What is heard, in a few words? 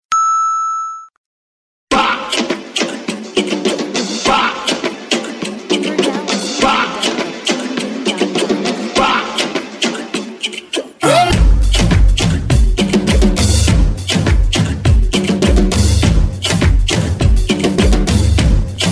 Samba